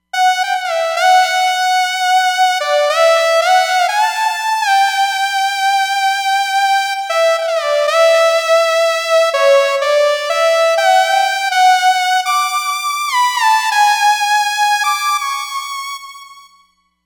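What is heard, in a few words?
Porta Solo Lead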